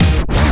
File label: DJ Disco Scratch 1 beat; Plants vs. Zombies Battle for Neighborville